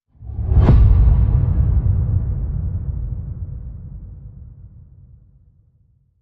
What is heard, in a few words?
Hit Low Soft Punch